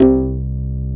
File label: Korg CX3, C 1